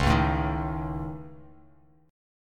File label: C sharp Suspended 2nd Sharp 5th C sharp Bass Standard, chord; strummed; 9,4,7,8